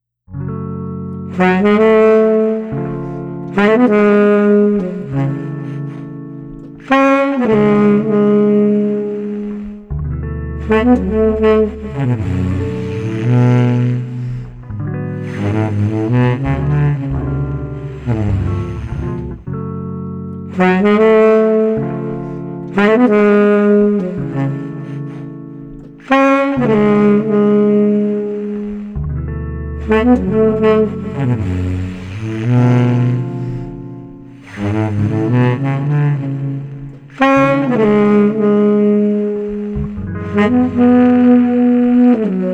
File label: Sax Guitar Laidback 100